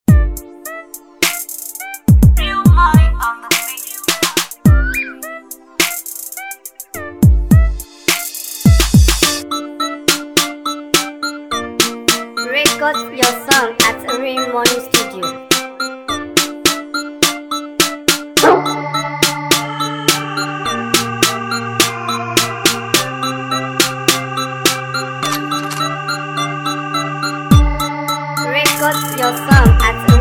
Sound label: RAP BEAT